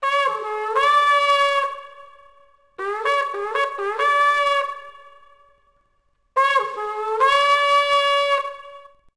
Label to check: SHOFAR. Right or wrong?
Right